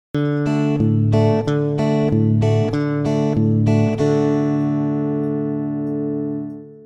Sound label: country tele d chord